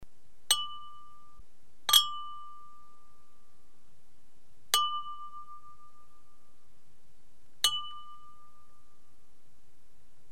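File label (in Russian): винные тонкие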